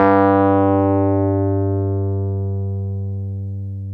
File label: PNO Wurly; Roland LCDP10 Keys of the 60s and 70s 2; Wurly 200B